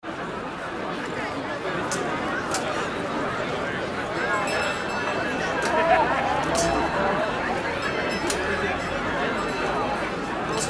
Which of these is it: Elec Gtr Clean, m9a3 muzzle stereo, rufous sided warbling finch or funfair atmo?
funfair atmo